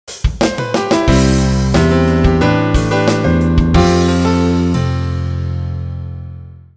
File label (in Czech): znělka